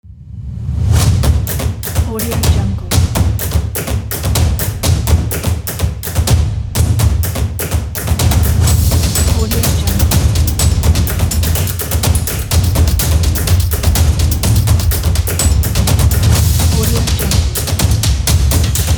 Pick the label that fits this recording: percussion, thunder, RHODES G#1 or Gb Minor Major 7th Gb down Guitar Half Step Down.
percussion